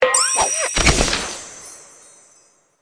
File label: bonus Multiplier Level; fairyred